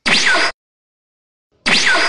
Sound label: Instant Teleport